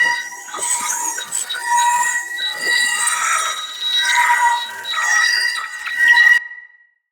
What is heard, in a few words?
Defend Song Loop; Teralyst; Vomvalyst